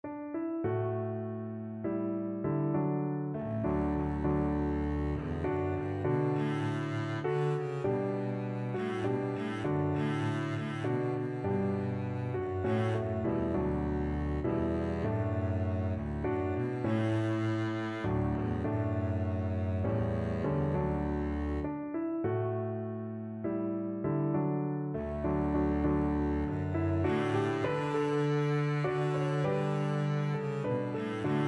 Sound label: Shenandoah, double bass